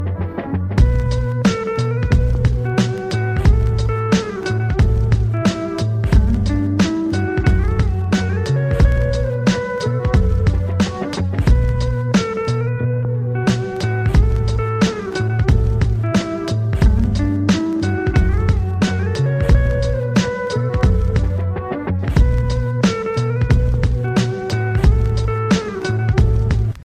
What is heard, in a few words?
instrumental ringtone